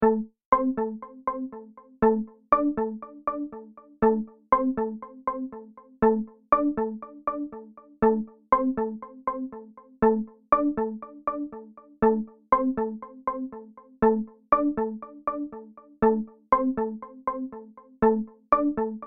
new iphone ringtone

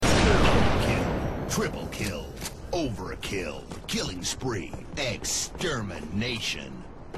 sniper shot; halo 3

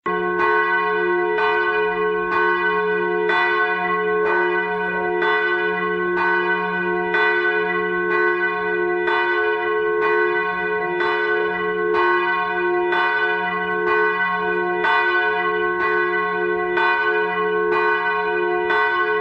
church bells

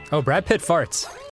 Farts; Oh Brad Pitt

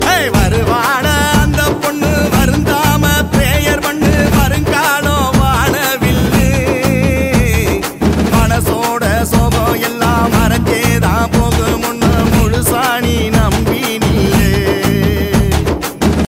Tamil

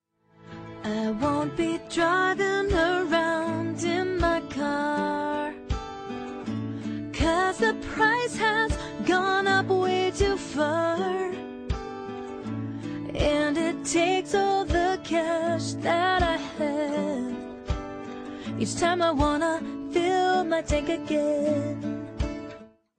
funny gas

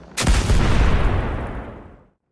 Scorch cannon short

